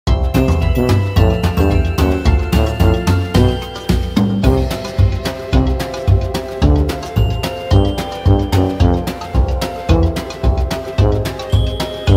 Snow; SMM2; NSMBU

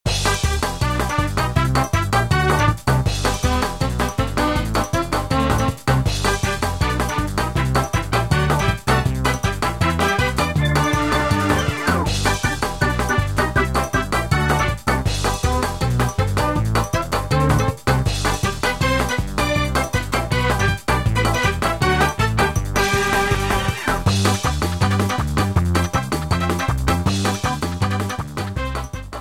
Music Battle; MKDD

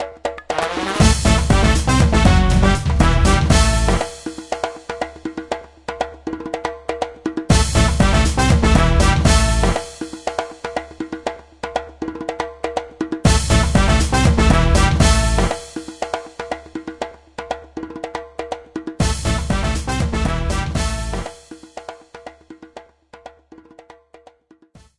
Bang Out a Drum

